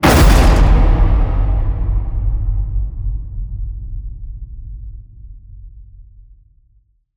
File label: HIT Metal Crash, Cinematic Hits Designed